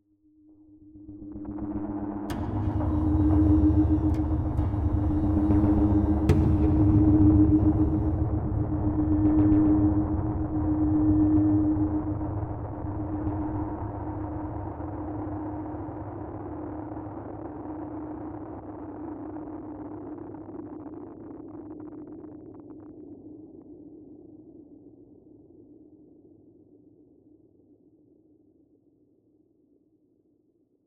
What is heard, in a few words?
suspense mood; inFamous; Play Station 3